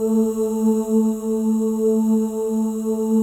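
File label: OH, female OHS, Voices; Spectrasonics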